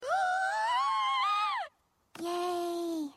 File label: Gasp Yay; Fluttershy